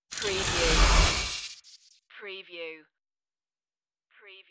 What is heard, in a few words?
electric starship door